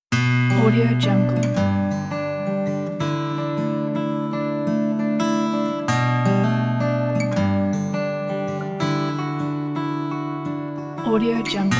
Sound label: relaxing acoustic guitar